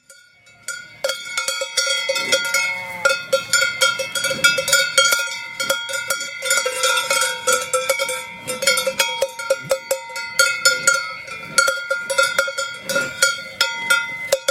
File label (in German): Rinderglocken; alpspektakel